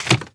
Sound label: Impact Human Grab Wood Frame; Impacts